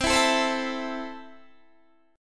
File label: E Suspended 4th Sharp 5th C